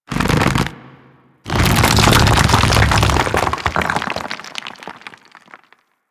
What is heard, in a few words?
Golem Death Fall Retract; Warframe; Foley